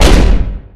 Slam Open Door

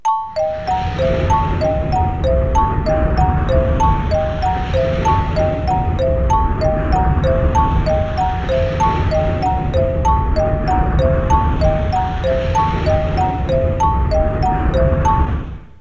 2-Player Mode Loading; Pikmin 2

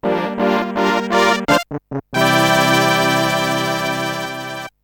jingle goal

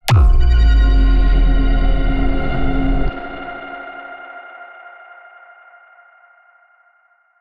Low Cinematic Impact

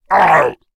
Creatures Fight Damage; Mighty Party; Android

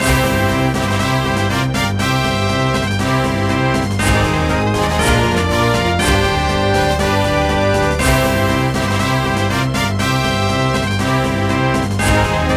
Win (Fanfare); Mario Golf Toadstool Tour

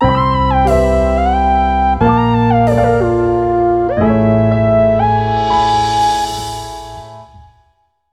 jingle ~ game over

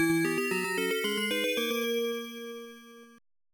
Music - Jingle

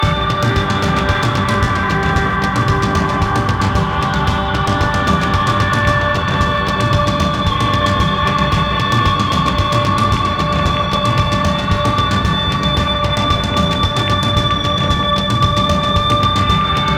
Tension Loop; battlefield hardline; MP End Of Match Rescue

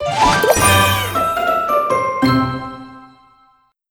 Crunchy Finish

